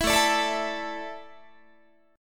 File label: A Suspended 4th, Guitar; Asus4; strummed; 19,17,17,19,20,20